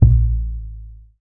TUB BASS A 1

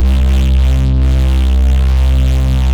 Bass FX Note, Drum & Bass; AMG - Prototype Drum & Bass

